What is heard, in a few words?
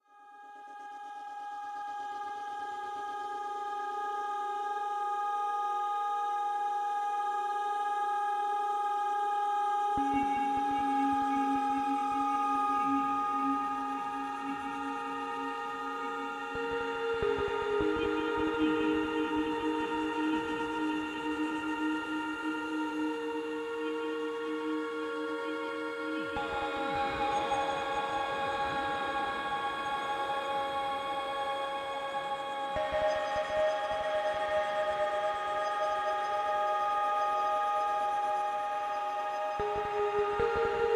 Rain Sounds Ocean Sounds